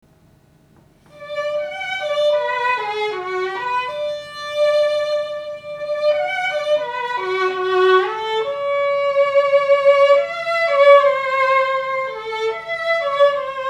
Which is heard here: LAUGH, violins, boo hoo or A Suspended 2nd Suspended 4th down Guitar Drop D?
violins